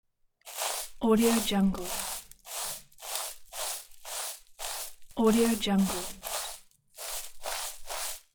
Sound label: broom